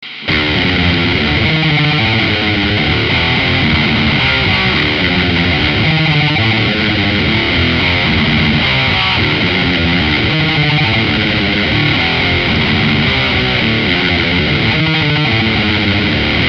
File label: metal tone